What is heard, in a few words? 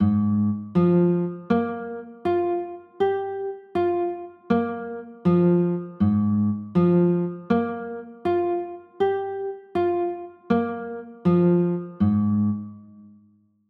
a flat maj13, chords